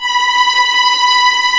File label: B5 TREM, VLNS TREMOLO, String; East West; The Ultimate String Collection